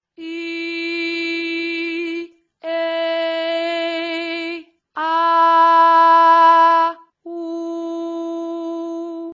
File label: vocals